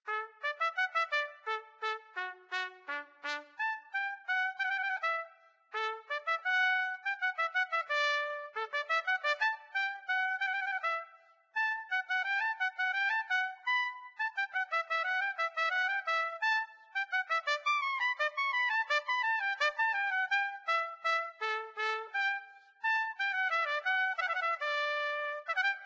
Trumpet; Zomboid; Lifestyle

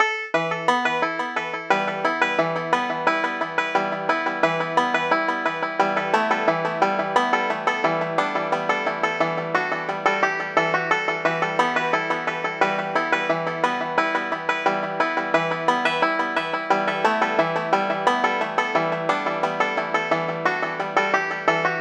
banjo; Zomboid; EntertainYourself

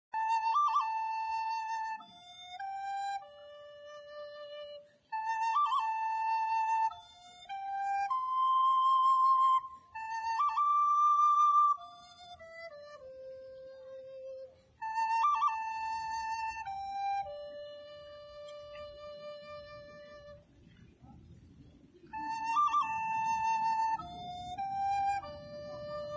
Flute; Zomboid; Lifestyle

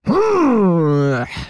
Woman Frustrated; Zomboid; Lifestyle

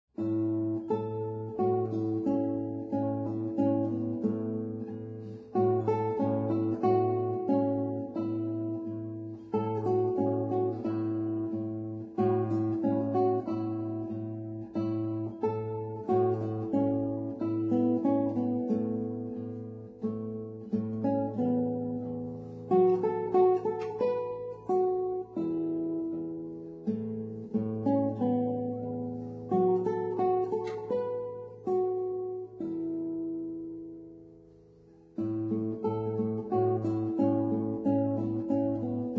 Guitar Acoustic; Zomboid; Lifestyle

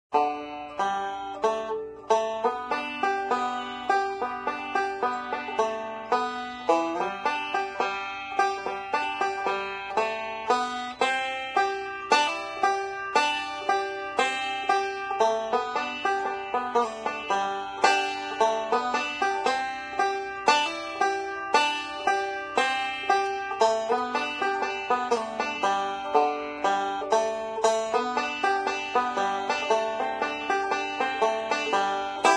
Banjo; Zomboid; Lifestyle

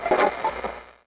Jukebox Switch; Zomboid; Lifestyle

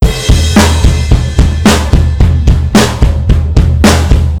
drum; Zomboid; TrueMusic; Tsarsound

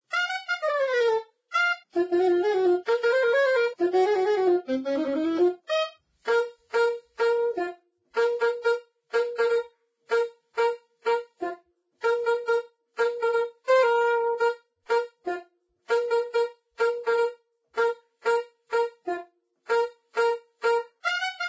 Saxophone; Zomboid; Lifestyle

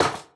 Nail Gun Fire; Zomboid; The Workshop

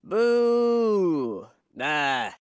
Man Booing; voice; Zomboid; Lifestyle

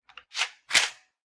MP5 Rack; Zomboid; VFE

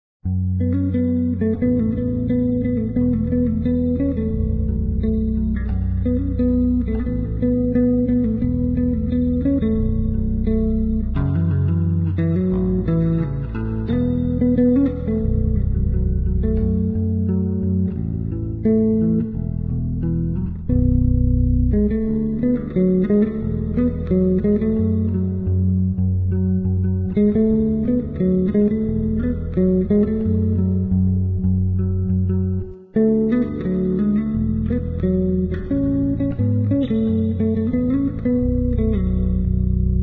Guitar Electric Bass; Zomboid; Lifestyle

